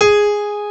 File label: PIANO AB3; St.Piano&Strings